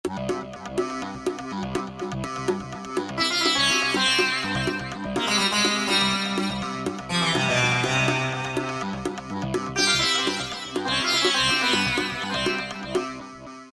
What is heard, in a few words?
Alesis QS8, Bhangra; Factory Preset examples